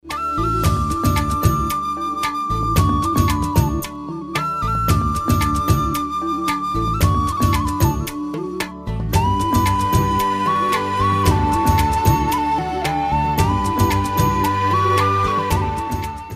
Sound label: love flute ringtone